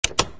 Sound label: handbrake off; doctorwho1200